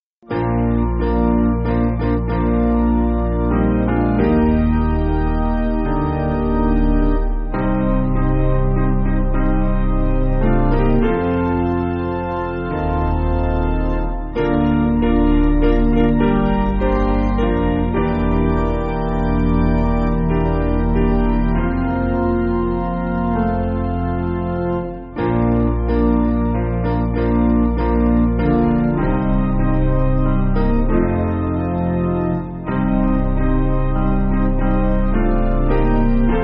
Piano

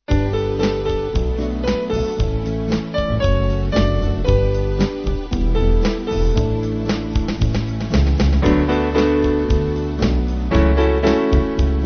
Band

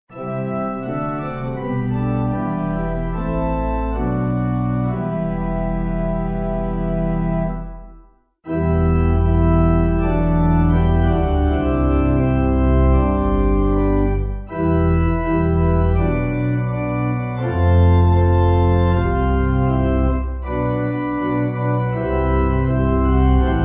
Pipe

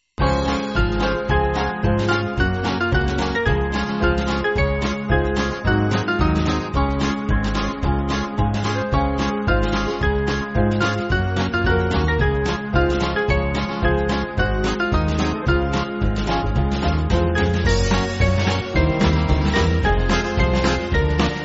Band

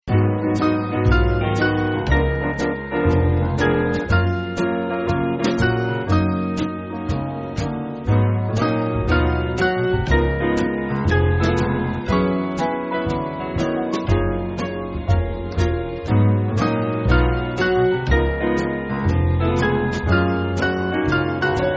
Jazz

